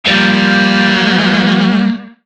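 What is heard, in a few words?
Shawzin Minor Chord; Warframe; Lizzie Shawzin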